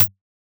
Clap Snare

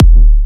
Big Kick; samples

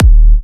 Big Kick; samples

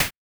Clap Snare

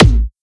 Tom F; samples

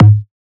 Basic Tom G#; samples

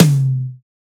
Tom C; samples

